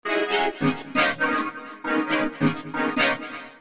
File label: vocoder